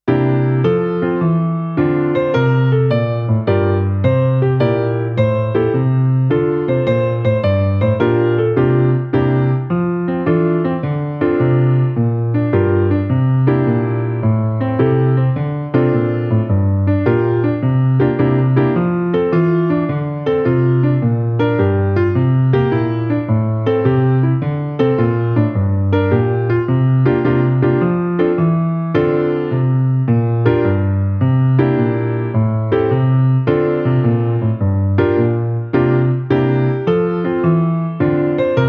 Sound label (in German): Playback Klavier